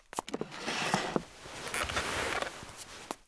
poposednuti; Mafie 1

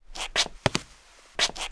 kroky; Mafie 1

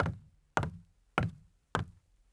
kroky drevo; Mafie 1

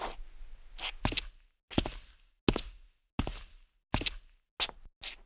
kroky; Mafie 1

